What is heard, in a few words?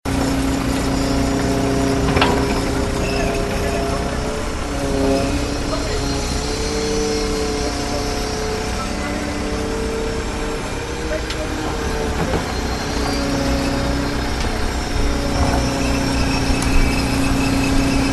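construction and repair sounds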